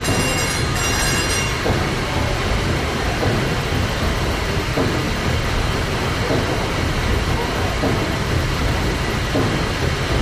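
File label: steam engine telegraph, WWII Liberty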